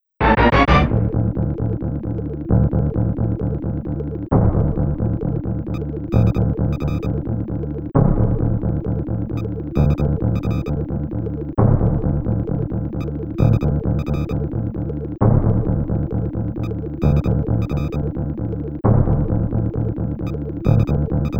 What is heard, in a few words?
Opening Music; Super Rom Cassette Disc in Jaleco